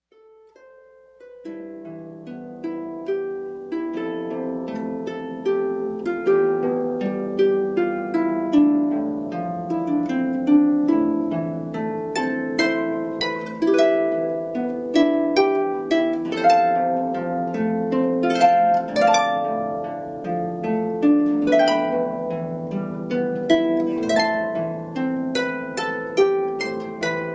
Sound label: Solo Harp